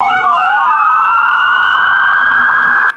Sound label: clean ascending electronic vehicle siren